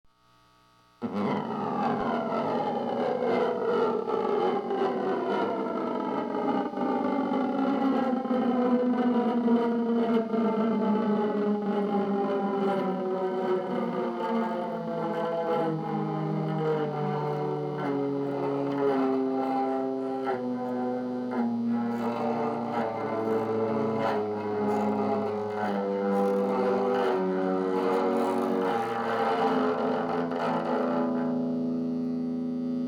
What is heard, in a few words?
pick scratch